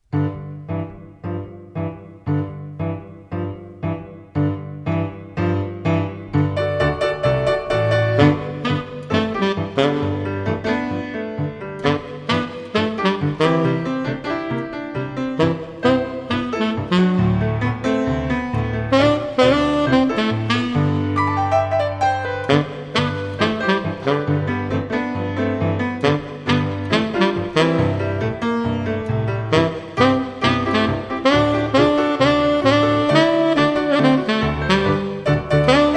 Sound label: jingle